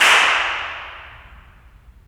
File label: lobby